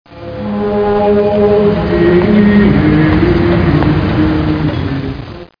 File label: chant; dangmer